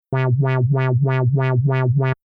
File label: synth lfo wow